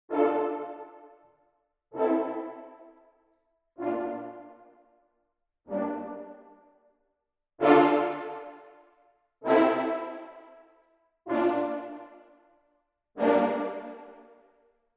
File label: Horns Staccato